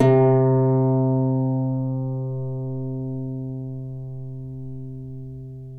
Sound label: Celtic Harp, Plucked Strings